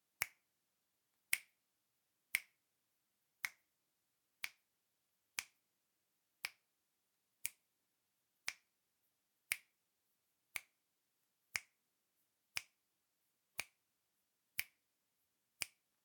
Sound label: Snipp